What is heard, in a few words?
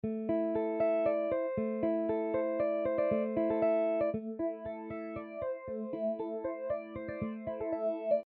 Phaser